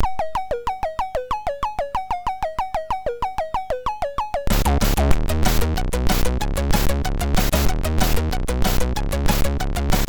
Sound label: Music fast, MOS6581R3